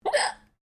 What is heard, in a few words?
FEMALE HICCUP; Female coughing and hiccups; Hiccupping; Human